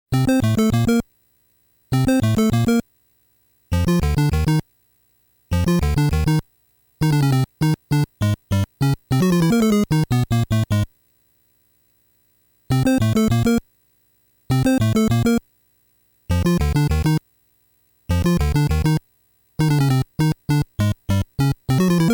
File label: underground bgm - warning - underground bgm (hurry up)